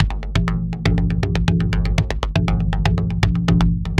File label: TALK, TALKDRUMSYNT, Yamaha VP-1; Music Store